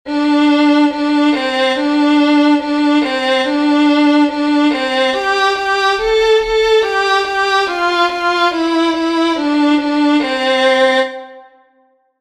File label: hang